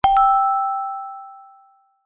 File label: multimedia alert notification ui ping chime mallet like positive